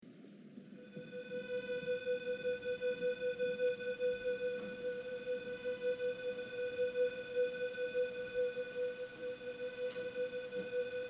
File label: Loop